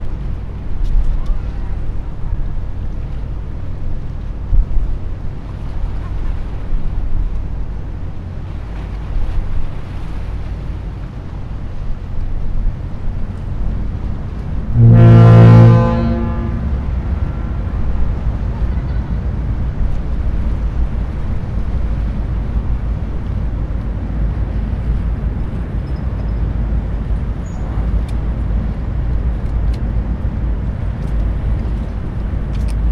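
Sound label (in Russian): звуки взлетающего парома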